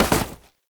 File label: Step Snow, Foley; Warframe; Oraxia